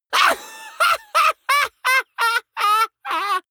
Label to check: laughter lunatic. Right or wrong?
Right